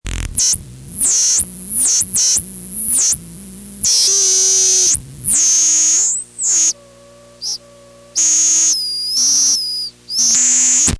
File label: zipp bird